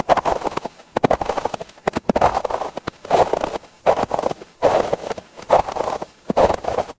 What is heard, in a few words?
footsteps snow